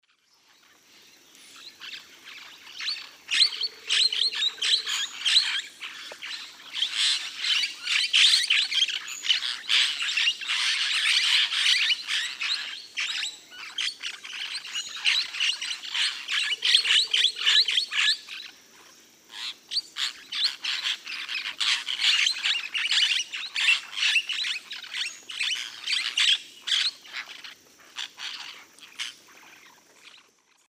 Pionus menstruus, calls group; S. America; Eastern Lowlands

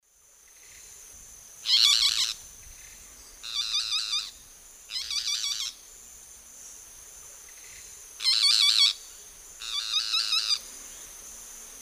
Megarynchus pitangua, songs 2 birds; S. America; Eastern Lowlands

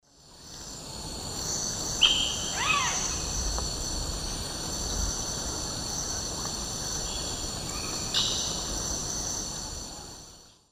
Piaya cayana, calls; S. America; Eastern Lowlands

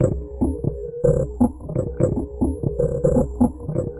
Finite Groove, Concrete Beats; Electronica

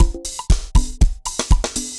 dancefloor perc 120 bpm

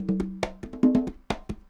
congas 140, latin, 140bpm; Sound Effect Library

